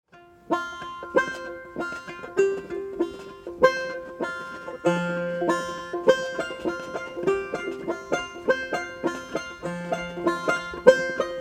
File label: Banjo Confusion